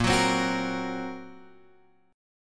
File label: Bb Suspended 2nd Sharp 5th Bb Guitar Drop D